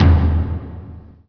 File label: omoi taiko